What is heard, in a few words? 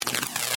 Electrical Short, Industrial, Crackle; Factories; BlastWave